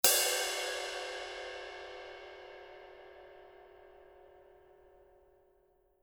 2002 black 19 big beat body, paiste

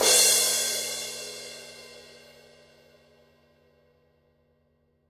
giant beat 18 thin edge, paiste

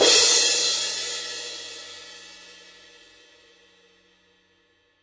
18 rock crash edge, paiste; pst 8

